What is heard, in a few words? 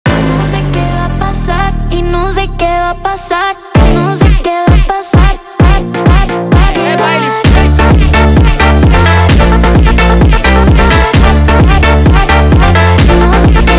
Phonk